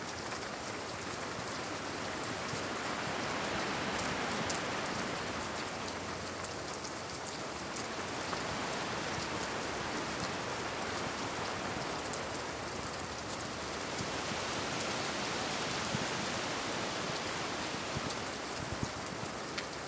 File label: rain; dota